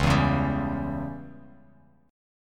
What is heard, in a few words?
Db Diminished, Bass Standard, Dbdim chord; strummed; JGuitar